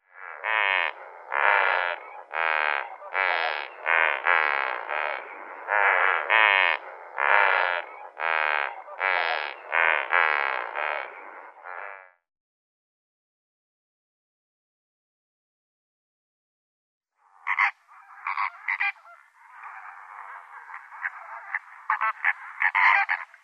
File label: Lesser Flamingo